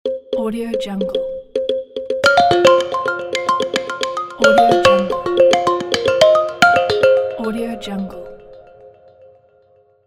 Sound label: hitech minimal logo; nikitaka